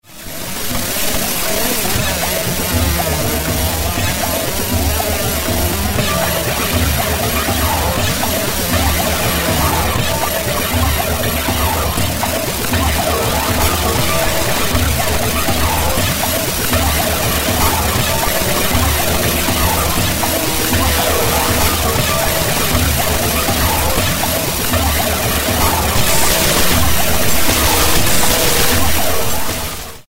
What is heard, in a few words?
Spin Cycle From Above